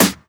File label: Snares & Claps; Vengeance Samples